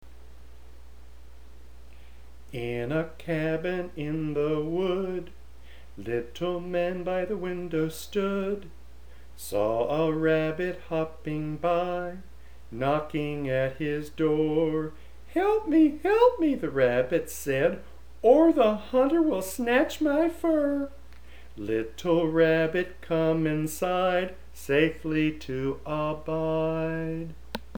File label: In a cabin, in a wood